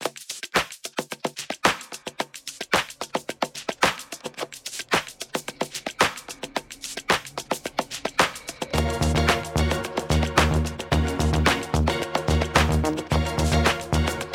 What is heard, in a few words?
boogie